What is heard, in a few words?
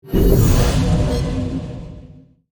Vampire vanish dark dissolve